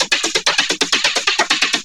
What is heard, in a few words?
Vocobeat; Sonic Foundry (Sony Creative Software)